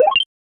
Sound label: short activation sound fo; ability